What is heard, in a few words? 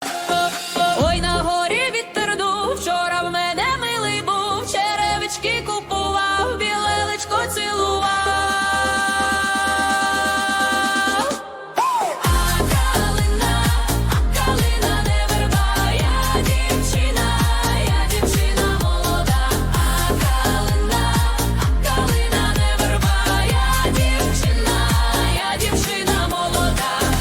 dj house bass remix